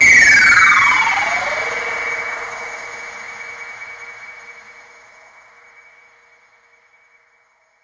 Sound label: Syn Fall; Zero-G; Soundclash Nu Dance Electro; Acidized Wavs; FX Atmospheres